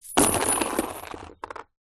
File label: money coins handful drop into cardboard box